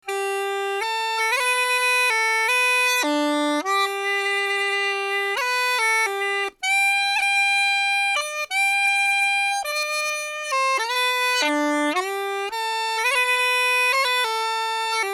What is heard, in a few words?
pipe air